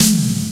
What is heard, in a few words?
ANALOG GIANT; OMI - Universe of Sounds